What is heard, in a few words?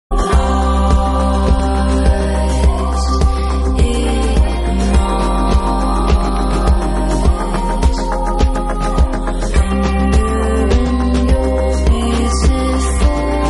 Chimes